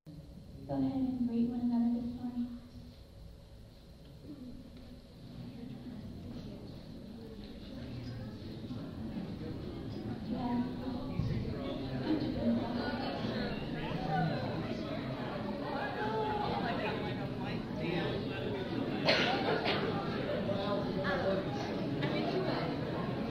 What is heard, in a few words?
greeting noise